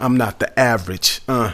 Rap Vox

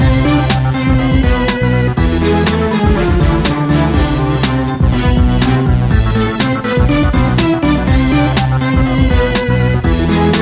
Quirky and Wacky Halloween Music

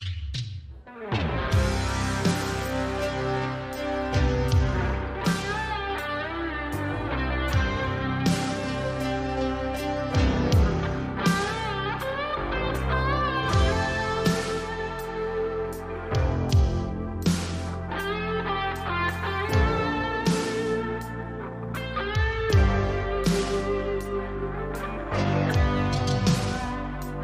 crying guitar

